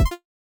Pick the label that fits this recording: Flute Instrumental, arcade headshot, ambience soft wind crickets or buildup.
arcade headshot